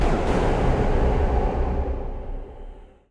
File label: Hi Hit